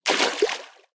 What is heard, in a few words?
Removable Water Splash Small